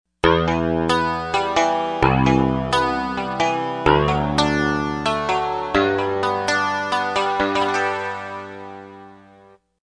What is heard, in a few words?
SingleCoil, Yamaha TG55; Factory Presets